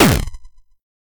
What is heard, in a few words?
Kick; SNKRX